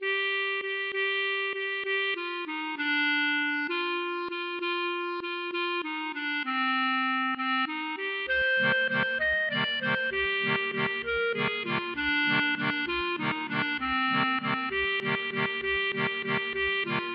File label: klezmer